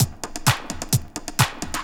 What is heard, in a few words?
drum 130 tribal, drumloops